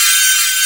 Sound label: DG WAVE, DIGI SYN